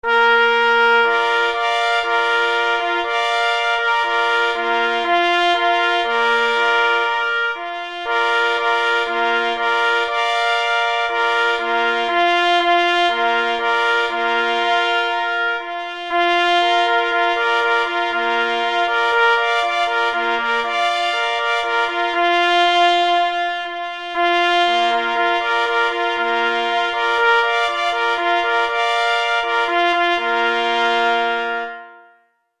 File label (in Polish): Hejnal na 3 rogi mysliwskie; K. Kadlec; OFMM; Brodnica 2016